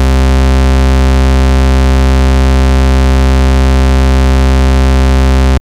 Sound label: Pulse C2, Moog Voyager; Moog Voyager Sounds; VES1 Pulse